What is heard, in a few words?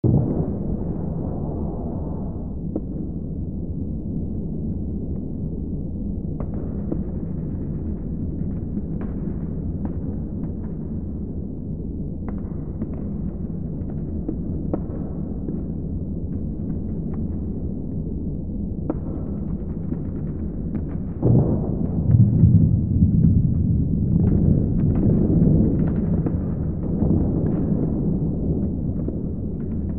Amb Town Int